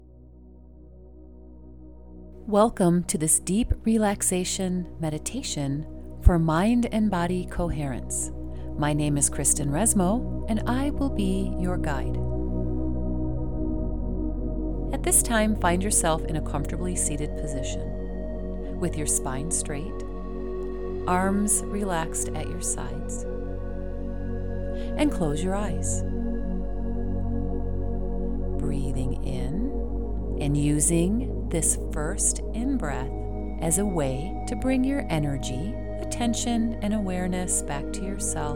theta waves for deep relaxation and mind and body coherence